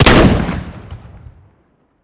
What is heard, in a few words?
m3 fire; weapons; csgom; cstrike